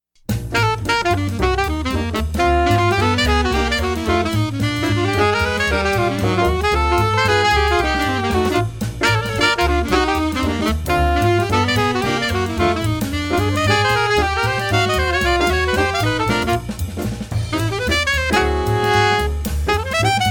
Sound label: Sax Duets altos; Columbus Drive